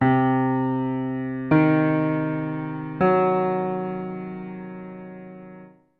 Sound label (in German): verm arp